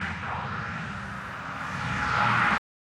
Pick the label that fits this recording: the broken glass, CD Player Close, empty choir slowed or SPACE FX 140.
SPACE FX 140